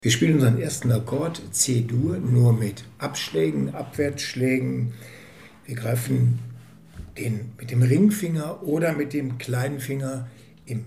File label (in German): C Akkord Ab und Wechselschlag, ukulele